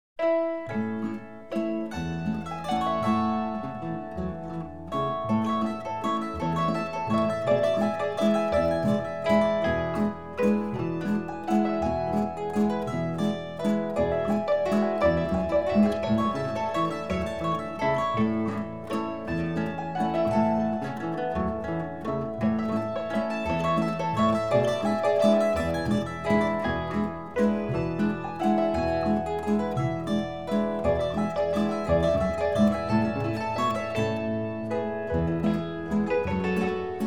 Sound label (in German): Stubenmusik